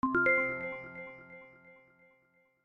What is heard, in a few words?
Win Sound; Reason Casual Puzzle